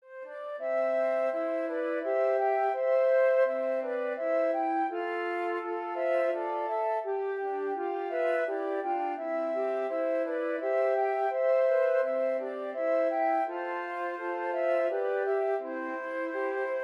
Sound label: Air Flute Trio